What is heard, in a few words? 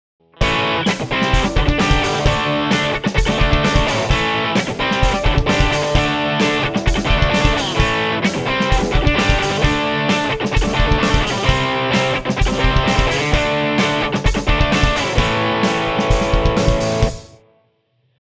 boss sd-1; Boss SD-1 test